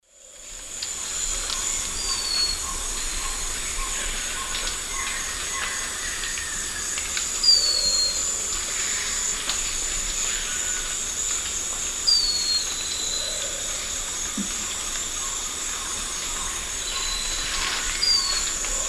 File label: Malacoptila fusca, song or call; S. America; Eastern Lowlands